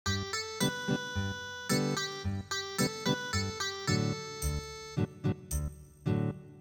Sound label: Ethnic Guitar